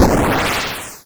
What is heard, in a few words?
boss death